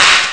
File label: claps; Amiga Soundtracker Sample Packs